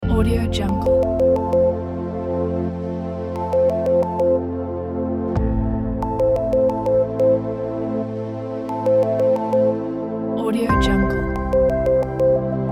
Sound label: cinematic uplifting tech electronic